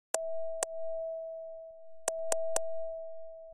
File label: tone; DreamBall